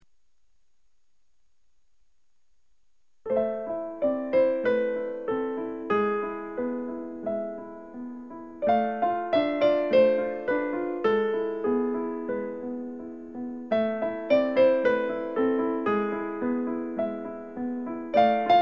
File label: Marching Bells